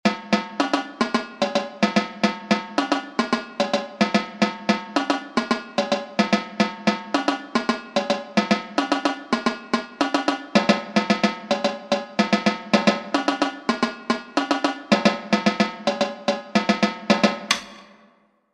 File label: tenors, drumline